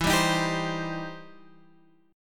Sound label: E Minor Major 7th Sharp 5th E down; EmM7#5; strummed; JGuitar